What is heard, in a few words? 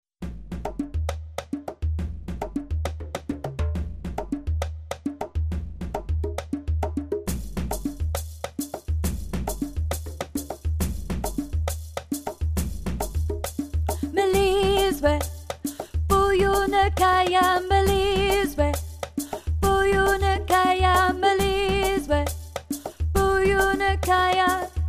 voice middle